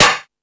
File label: House Machine, E-MU Pro-Cussion